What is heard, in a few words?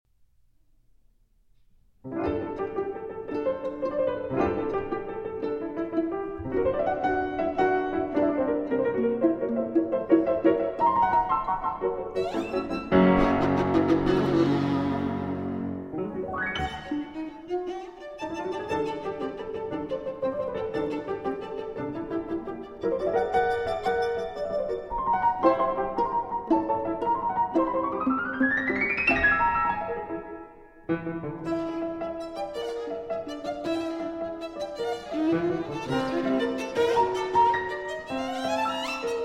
Viola Sonata, Vivace; R. Clarke